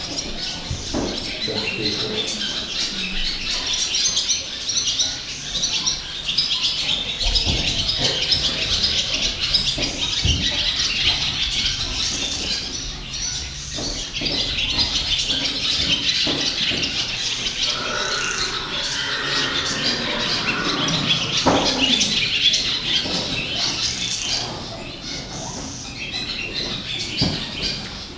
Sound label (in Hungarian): fecskék, ló istálló; termeszetben